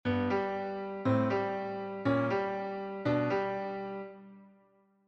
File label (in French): dominante, gospel piano; Sur accord de Dominante; Gospel Licks